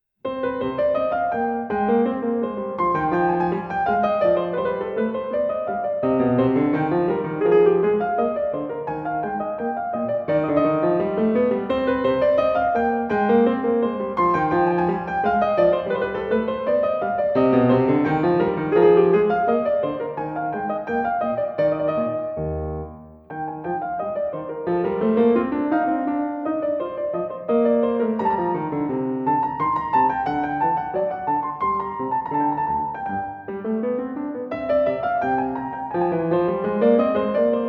French Suite No. 2 in C Minor Air; BWV 813 IV.

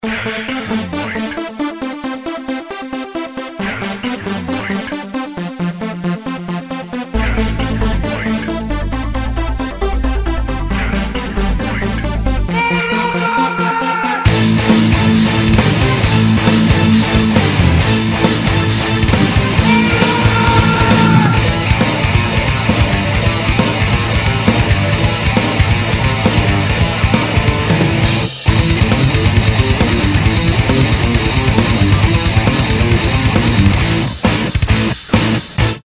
Main Theme Metal Cover ringtone; Mortal Kombat

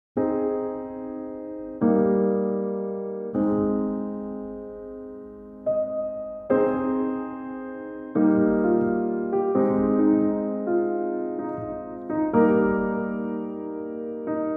Romantic Piano Music

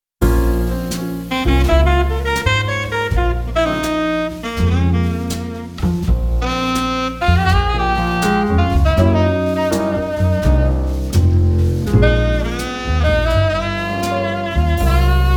Instrumental jazz musique d ambiance

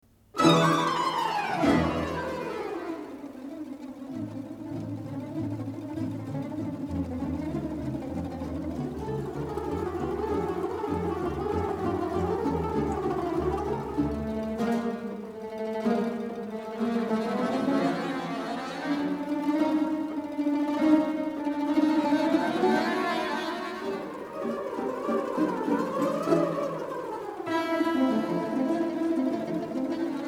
Violin Ensemble; Irina Saizewa; Bolshoi Theatre, Moscow; Julij Rejentowitsch; Rimsky-Korsakov

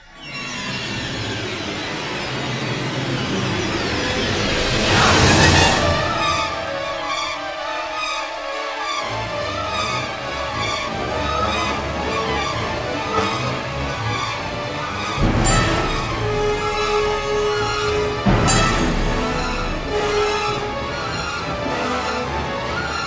Polska; Per Gudmundsson & Bj rn St bi

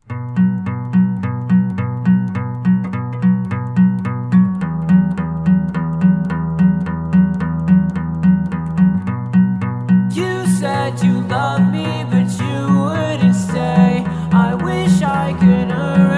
Guitar Riff; Andrew Baena

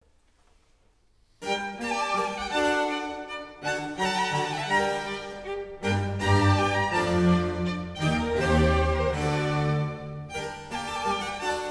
Kammerorchester, Concerto grosso in G Minor; Op. 6 No. 8

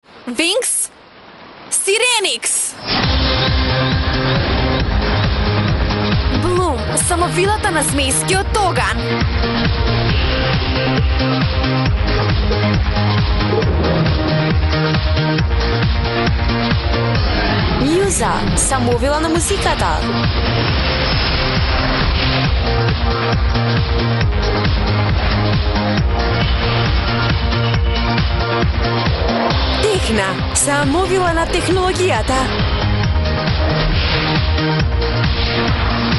Instrumental; Winx Club 8; Mandarin Chinese

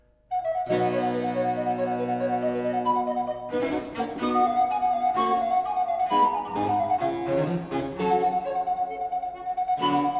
Sonata for Recorder and Continuo in C Major

